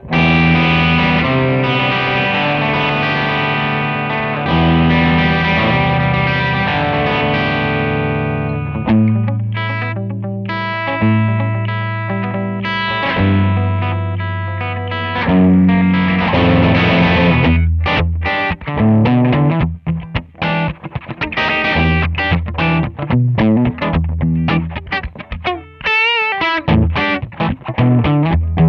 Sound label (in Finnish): jokuvox lp; pod2